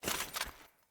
Foley Action Armour Walk; Characters; Mother Armour

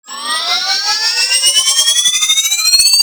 Defense Speaker Charge Up; Warframe; Nineteeen Ninety Nine

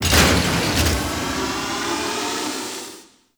Radar Ship Console Activate; Warframe; Ambience; Grineer Railjack POIs

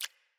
Rat Water Step

